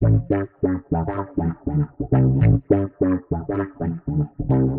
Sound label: Warped Bass, 100 A; Loops; Weather Man; Touch Loops; CosmicFunk